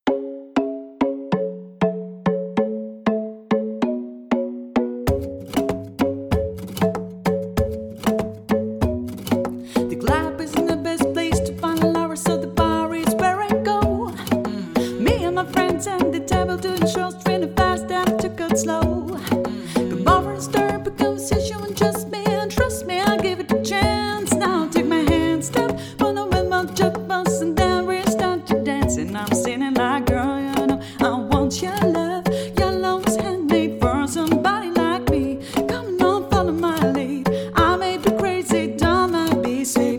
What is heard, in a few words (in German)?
Partyband 3er Piano Drums Gesang Band; Hochzeit; Hannover